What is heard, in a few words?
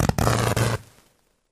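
Electric Arc Short Circuit; arcs & sparks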